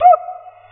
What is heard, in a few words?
OPN CUICA; Real Oldies2